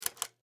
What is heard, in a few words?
Light Switch On, Foley, Light, Switch, On, Wall, Mount; Household; BlastWave